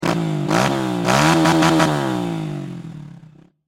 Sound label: THROTTLE THRASH